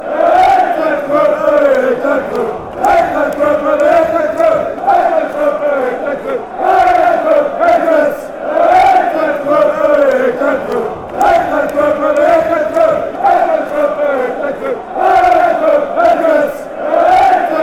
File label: beerschot chant